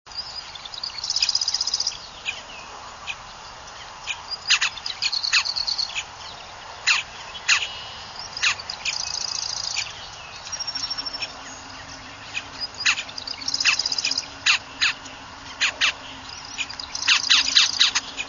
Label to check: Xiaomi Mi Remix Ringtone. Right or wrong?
Wrong